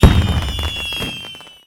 Fireworks; Zenonzard

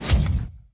puff smoke

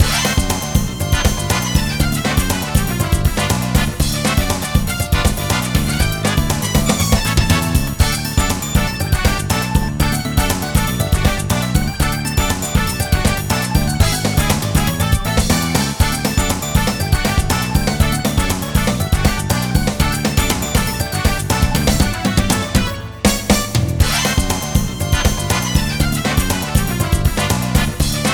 Bowling Menu; Super Monkey Ball 2

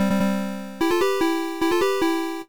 Jingle Metronome - Clear; Donkey Metronome - Clear; Nintendo DSi

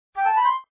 Flute Trill; Feed Fribbit Colors

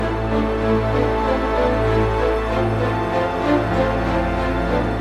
jingle next round

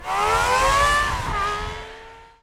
Accelerate; pac man kart rally android

